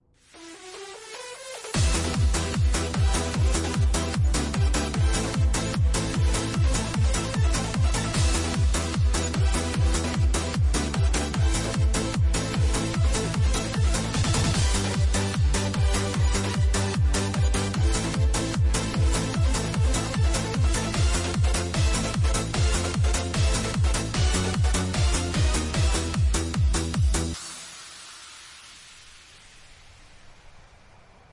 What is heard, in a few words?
eurobeat